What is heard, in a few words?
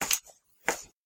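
footsteps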